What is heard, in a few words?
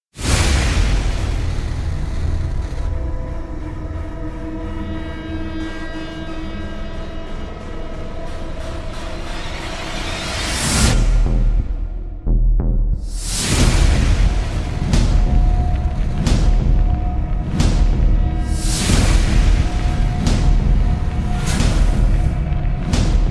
trailer backends death hunt